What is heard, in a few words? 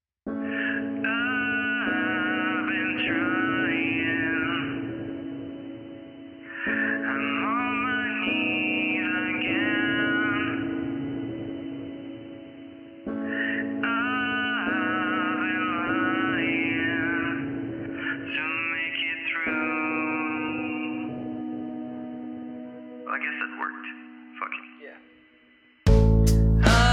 rain song